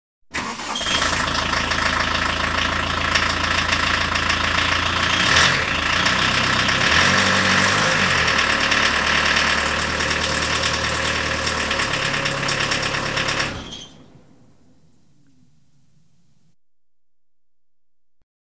Audience Applause; Valentino Production Sound Effects Library; Office sounds

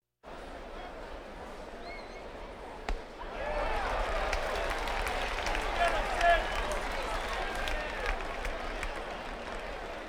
Crowds Race cars Baseball

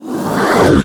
warning; Apotheorasis

